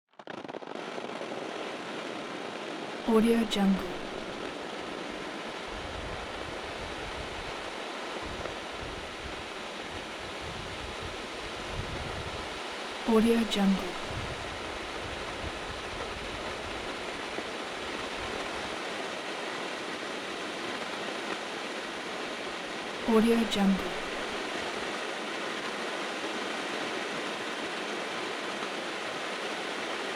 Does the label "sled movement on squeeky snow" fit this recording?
Yes